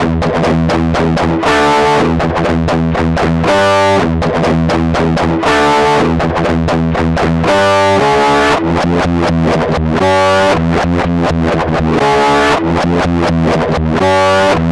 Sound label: Power Riff; non-linear audio reverser